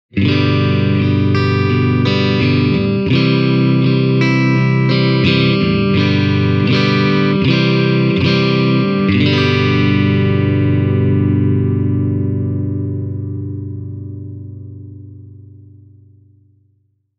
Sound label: Tokai Roland clean